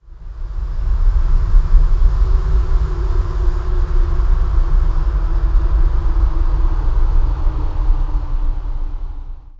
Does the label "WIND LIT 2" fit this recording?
Yes